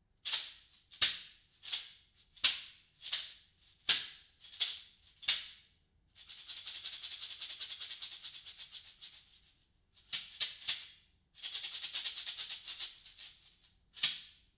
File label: Shekere; SE se4400; Lewitt